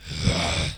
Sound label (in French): grognement de bête